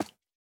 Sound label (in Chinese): 青蛙脚步声